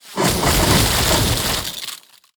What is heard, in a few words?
Ice Stome Area